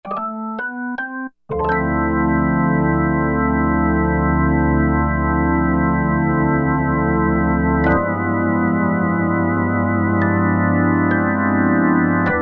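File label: B3 Mod Leslie; E-mu Proteus-1; Presets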